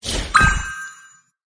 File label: dissolve; RedHotRoast; GemCrushAssets